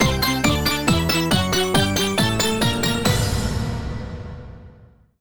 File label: Outro; Anarchy Splatcast; Grand Fest